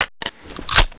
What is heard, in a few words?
Inf Weapons Foley 3rd; Red Orchestra 41-45